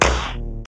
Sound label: perc